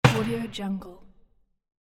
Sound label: basketball hits backboard; kazoomx